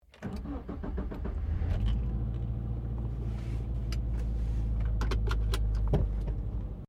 CAR SUBARU LIBERTY INTERIOR IGNITION SEATBELT ON; Subaru Liberty 20 01; Family members Subaru; Vehicles

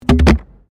PLASTIC RUBBER PIPE

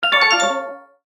Multimedia Chime, Technology, Multimedia, Chime; Chime Sound Effects; Chimes (Sound Effects:Multimedia); BlastWave

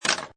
Pinball Sound Bumper Ka Klunk; Video Games

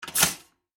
household toaster push down; Kitchen; McKinney Sound

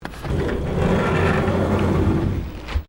Door Sliding Exterior Aluminium Flyscreen Open; Various doors

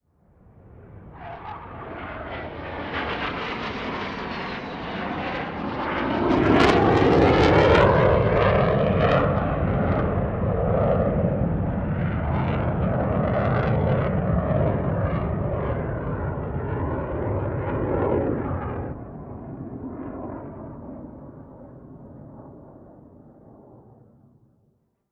Jet Fly By Pass Overhead; Airplane; Warfare; Blue Angel; Airplanes; Blastwave

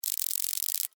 Bug Zapper Kill, Insect; Extermination; Miscellaneous Insect Sound Effects; Blast Wave

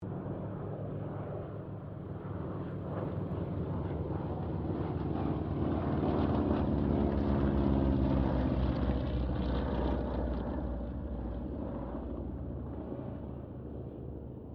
C195 squadron 2 pass, Airplane; Squadron of 2 Cessna C195s; Airplanes (Sound Effects:Vehicles)

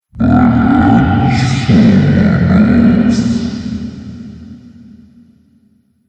Zombie Drunk, Zomby Sound Effects; Horror

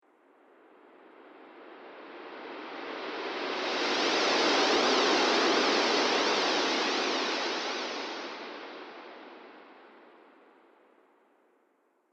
Space Whoosh Faint, whoosh sound effects; sound design

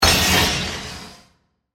Hit Debris Break, Pneumatic, Air, Hiss; Production Element; BlastWave

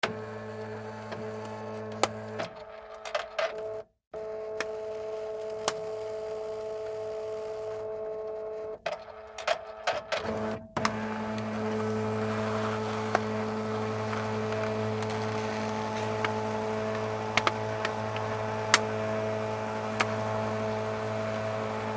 Office Fax Machine Operate; Electronics

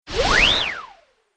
Whistle Whoosh

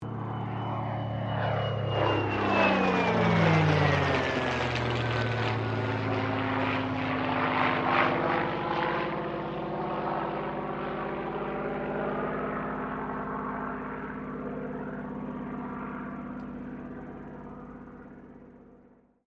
AIRCRAFT SUPERMARINE SPITFIRE MK-XVI PASS

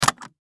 Trunk Unlatch Metal Case Luggage; Objects; Foley; Blastwave

